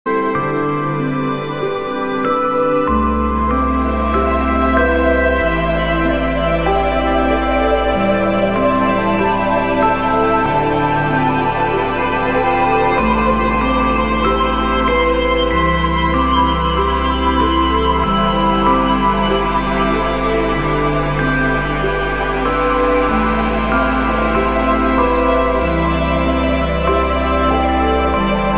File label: ambient